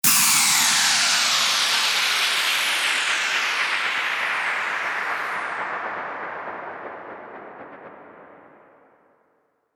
WHOOSH

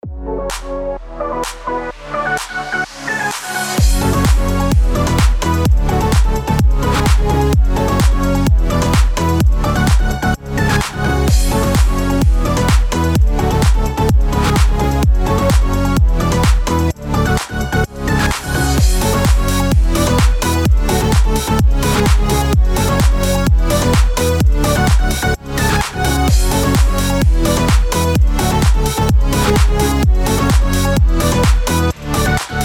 BED ELECTRO HOUSE F#MIN 128BPM

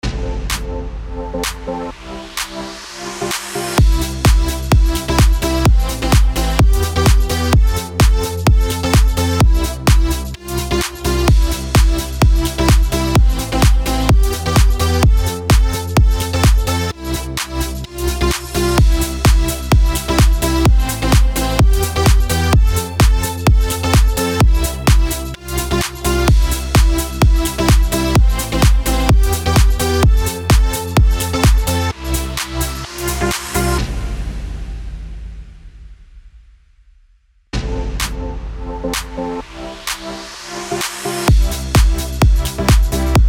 BED HOUSE CMIN 128BPM

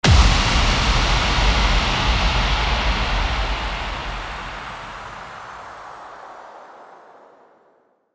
IMPACT

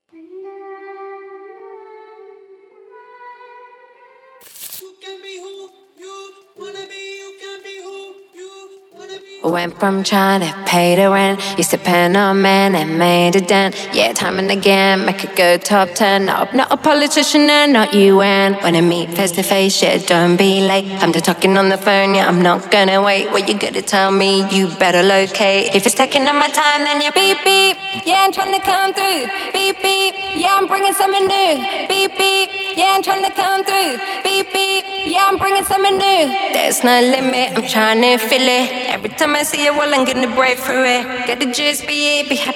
ACA 102BPM Gm

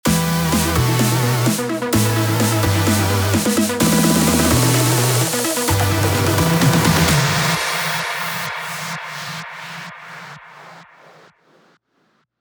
STAGER E min 128 BPM

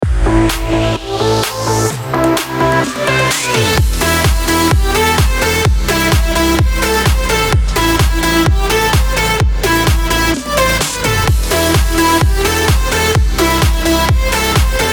ELECTRO EMIN 128BPM

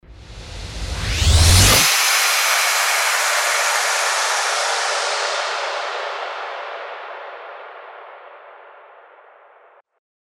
RISING WHOOSH

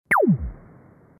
LASER

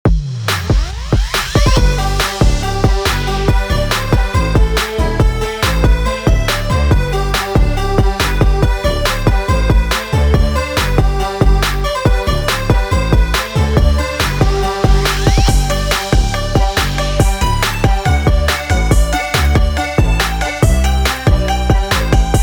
BED IRISH MEDLEY G MAJ 140BPM

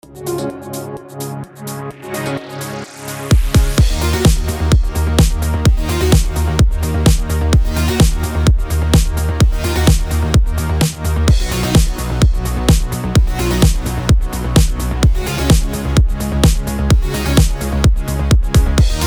BED ELECTRO D#MIN 128BPM

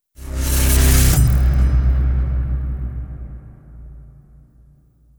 RISING IMPACT

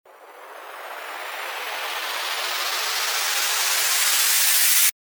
RISER

